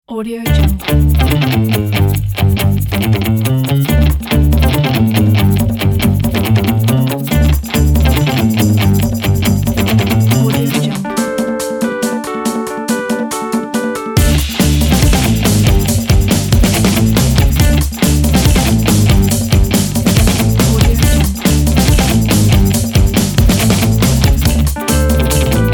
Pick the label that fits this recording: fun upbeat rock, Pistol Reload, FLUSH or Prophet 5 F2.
fun upbeat rock